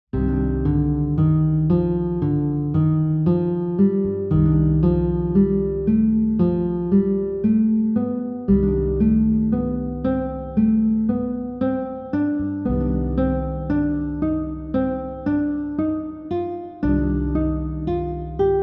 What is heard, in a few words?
4 note ascending scale pattern melodic minor, guitar